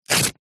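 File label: household instructions pamphlet glossy paper rip; zapsplat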